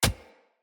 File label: Short Sword Impact Player Flesh; Weapon Impacts; Enemy; Swords; Sinecure Short Sword